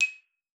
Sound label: D#6, xylophone; Xsample VOL-4